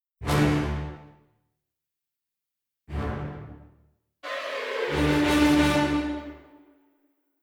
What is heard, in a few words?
Combat In; The Witcher 3 Wild Hunt; Skellige